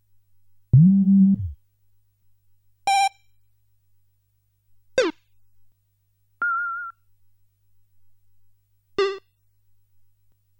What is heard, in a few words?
Rolling Shield Charge SFX